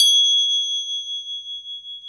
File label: Crotales, Orchestral Perc